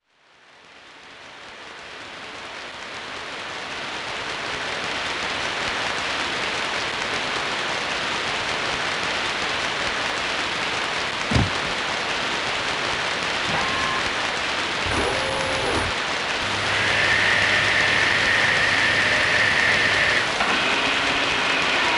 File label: Soundscape; Dreamweb; Dreamweb Remastered